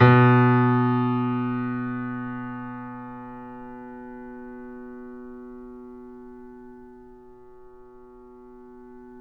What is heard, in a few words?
Grand Piano Mp B-1; AKAI S6000 CD-ROM - Volume 2; Grand Piano 256M